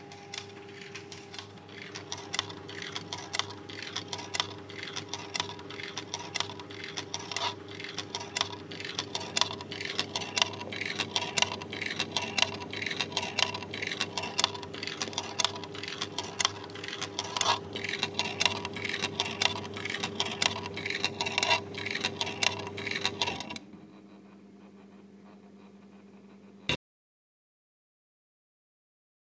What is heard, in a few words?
techno tumbledryer